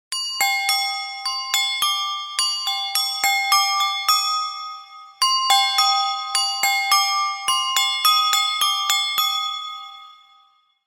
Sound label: gentle bell ringtone; android